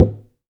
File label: Plastic Box, household rhythm samples Hits